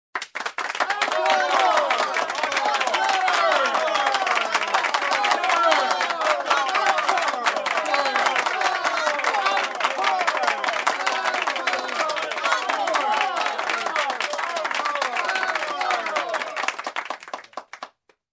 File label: Crowd Applauding and Cheering